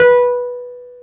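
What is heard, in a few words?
Wurly B, piano; Keyboards of The 60's and 70's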